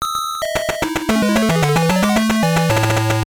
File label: Jingle; Sanrio Carnival 2; gb